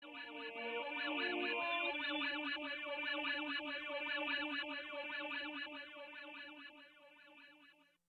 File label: Sirene